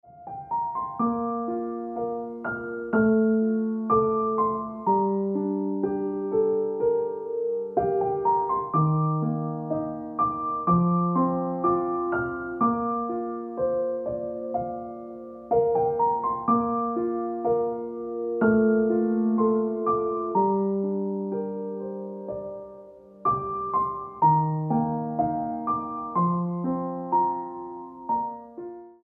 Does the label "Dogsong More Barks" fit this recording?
No